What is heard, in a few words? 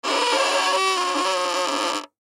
Cartoon Violin Scrape Creak Slow Musical; Cartoons; Blastwave